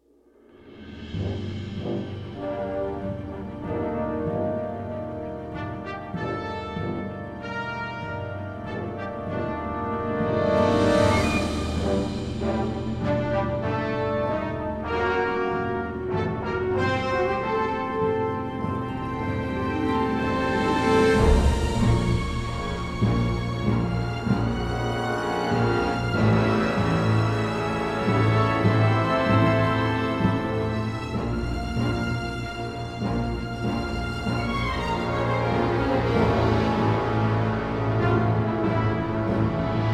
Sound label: Brass; Musical Sampling Adventure Brass